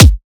Kick, Drums

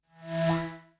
Flash

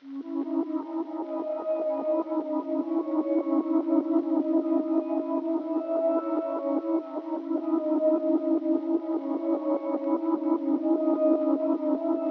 151 BPM C# Min Pad; Melody; Loop Stems; Cymatics; 2022 Melody Collection Platinum Expansion; Lockdown

